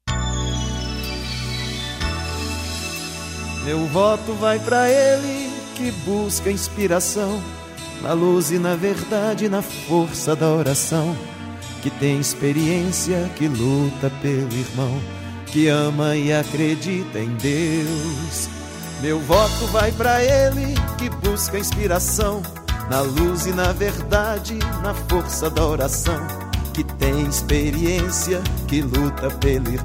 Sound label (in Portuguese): jingle estilo gospel; deputado miguel martini